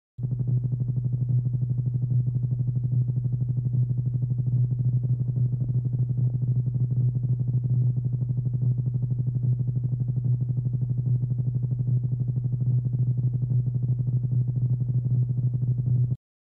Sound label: Low Frequency Pulsat, synth tones